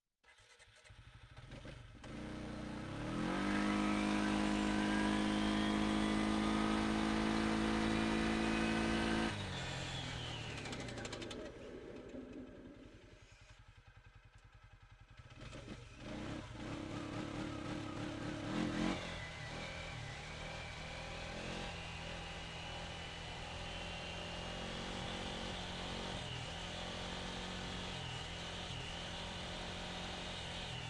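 Textron Alterra 500 onboard fast start drive stop reverse stop drive off engine intake; dpa4062; Sonniss GDC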